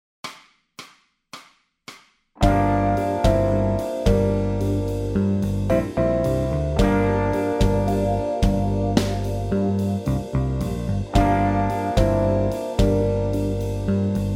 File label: guitar scales, backing tracks